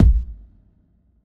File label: big room kick drum single shot a sharp key